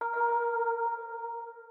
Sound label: organ